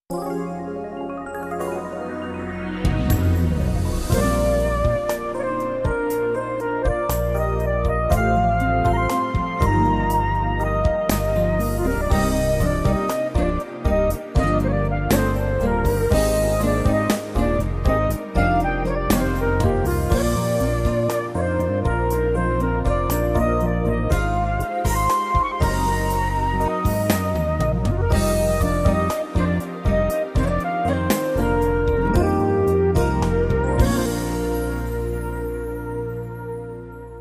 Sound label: Synth Ballad